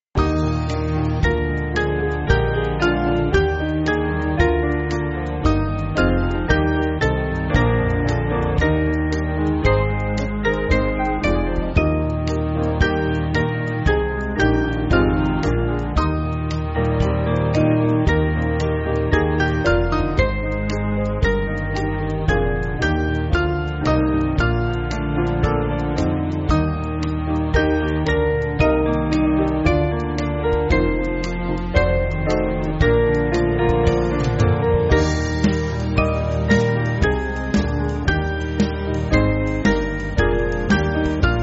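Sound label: Band, Backing Files for Churches; Plymouth Dock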